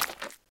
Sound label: Smack; Lewd SFX